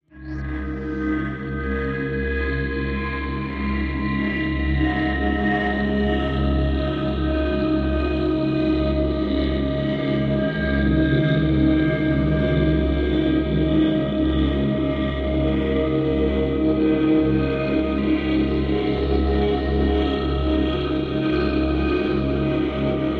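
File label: Edge View Slow Medium Hollow, evolving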